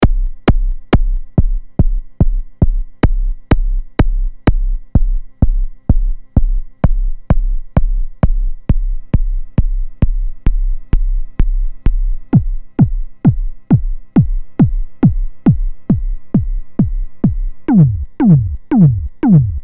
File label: SID KICK